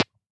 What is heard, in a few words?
Marble Bounce